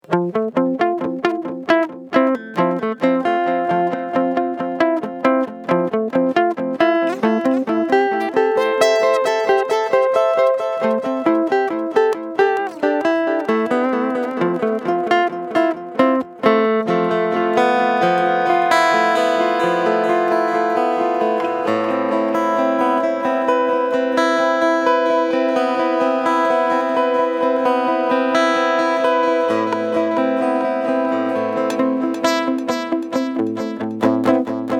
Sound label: Delay; LAVA Music ME-4 Carbon Preset